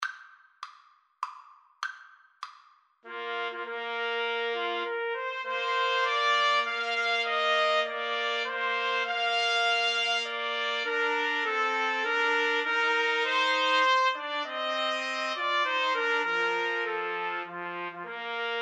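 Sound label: nocturne trumpets, karaoke; Trumpet Trio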